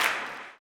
CLAP, Blues Soul; Spectrasonics Vocal Planet

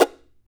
CHARANGO FX; Spectrasonics; Hans Zimmer Guitars VOL-2

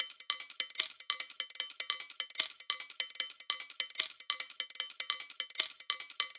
Kitchen Foley 150BPM, drums; Antidote Advent; Kitchen Foley Sounds & Loops

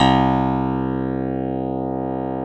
CLAV C

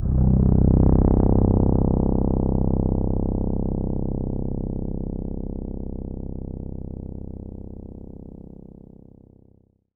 C1, Synth Line; 90 s Sample CDs; NiceBeats; SynthLine VOL-24

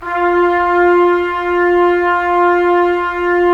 BRS TPS, Trumpets; Roland - LA Composer vol. 4

